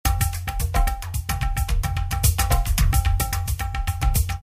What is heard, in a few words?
Tabla 110; Tabla Guys